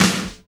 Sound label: SNR SLAPB, Snares; Roland - Rhythm Section; Snares 5